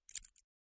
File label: padlock key turn